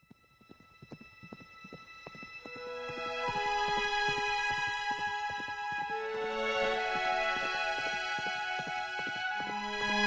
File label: horn